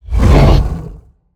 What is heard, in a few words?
Monster; Zenless Zone Zero